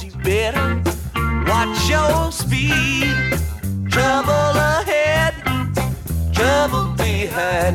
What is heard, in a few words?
music; wu xing; earth